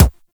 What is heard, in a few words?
Weapon Punch Pistol; Warframe; Weapons